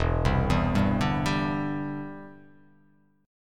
E Minor 7th Sharp 5th E; arpeggiated

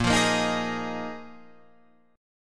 Bb 7th Suspended 4th Bb Guitar Open F; strummed

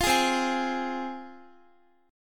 C Suspended 4th Sharp 5th C, Guitar; Csus4#5 strummed; x,x,15,13,12,15

